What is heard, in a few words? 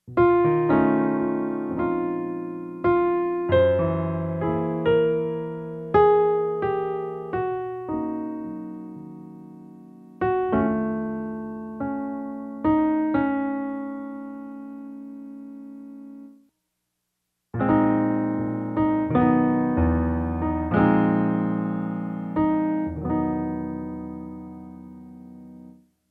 minor phrase